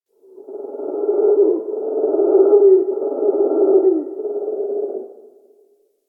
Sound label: Forest Creature Night Monkey Low Distant; Warframe; Grineer Forest